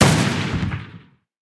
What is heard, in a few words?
gun